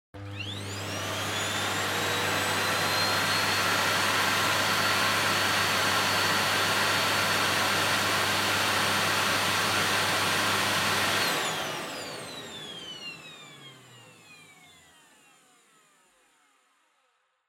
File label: Vacuum Cleaner Vacuum High Long